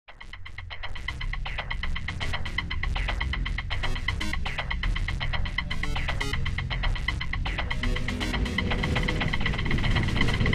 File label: Buildup